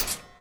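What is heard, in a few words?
attack naginata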